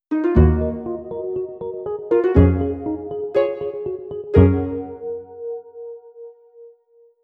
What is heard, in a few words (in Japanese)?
ジングル; touhou